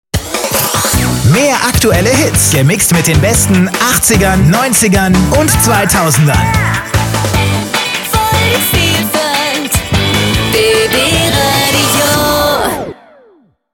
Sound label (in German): Jingle; BB RADIO